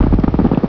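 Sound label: machine gun burst; ambience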